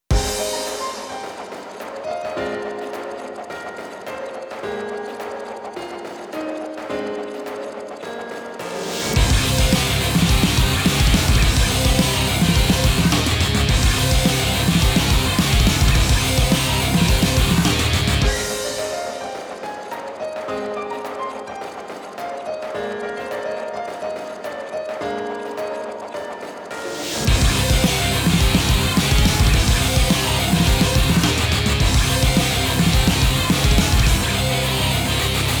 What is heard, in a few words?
(Inst); Caligula Overdose Original Soundtrack